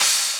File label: Crash